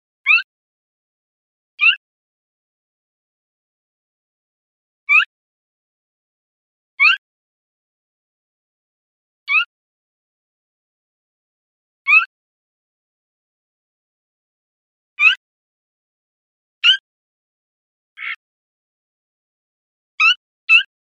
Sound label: CALLS, birdvocals